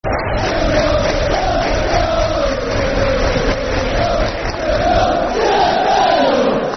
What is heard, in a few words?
Sheffield, Sheffield, Football Chants (Soccer Songs); Sheffield Wednesday Swfc